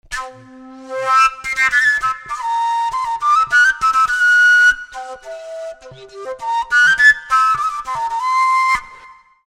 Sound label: kalyuka C